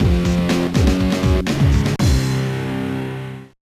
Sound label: Mission Failed; Tom Clancy's Splinter Cell: Pandora Tomorrow; GBA